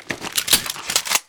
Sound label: Gun Draw Select Weapon, Weapons Foley; SFX Kit